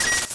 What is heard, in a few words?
pick up coin; Open Zelda; Quest Designer 2.0.4b; Objects